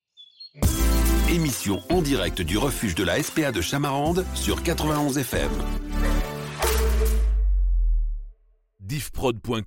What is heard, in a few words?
Sweep; 91 FM; SPA Chamarande